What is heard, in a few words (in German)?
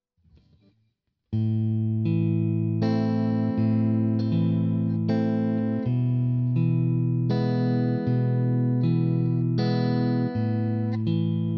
Zupfmuster, gitarre